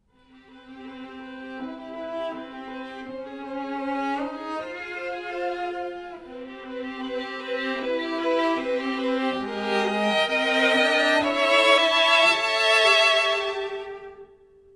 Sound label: String Quartet